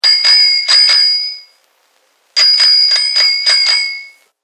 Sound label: Bell Bicycle Old; Bicycle Sound Effects; Vehicles